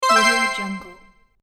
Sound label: retro 90s video game confirmation menu sound